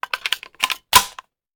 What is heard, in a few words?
Machine Gun Foley; Sound Ideas; Guns